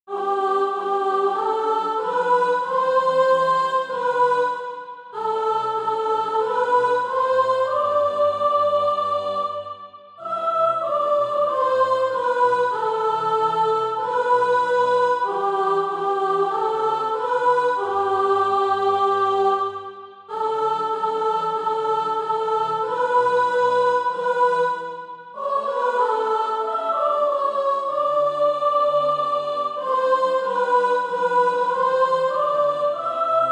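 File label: sop, Practise Music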